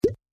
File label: btn common